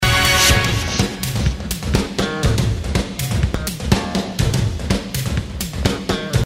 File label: drums